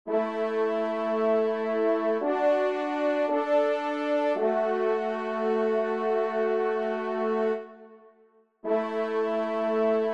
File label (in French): TRP; L'Etang de Saint Bonnet; J Poncet